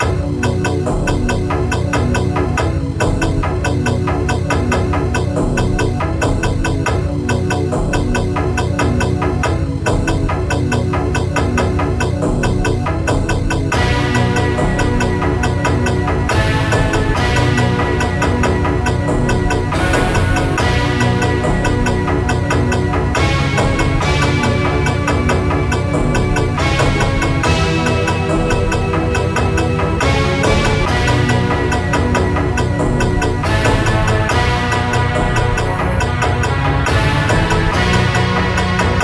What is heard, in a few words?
dramatic interlude; Trackers; Modland